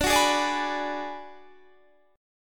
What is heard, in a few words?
D 6th Flat 5th D down Guitar Standard, chord; D6b5 strummed; JGuitar